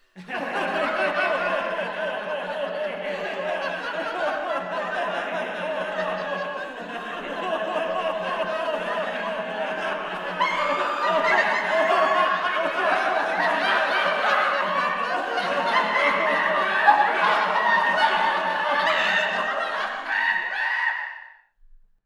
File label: scary laughter